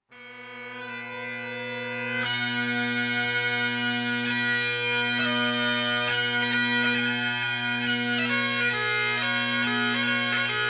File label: Birkhall Hornpipe, bagpipes